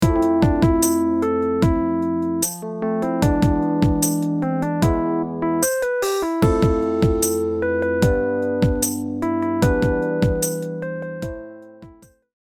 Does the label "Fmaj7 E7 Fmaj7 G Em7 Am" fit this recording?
Yes